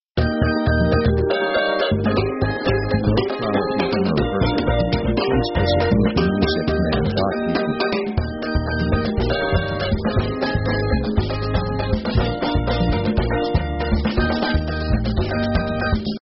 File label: pop caribbean